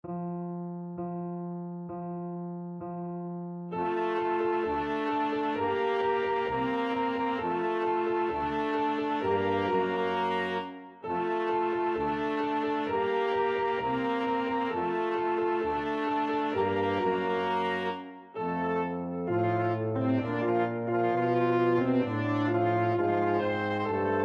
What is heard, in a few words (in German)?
Super langsam